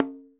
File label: tom, Roland MC-909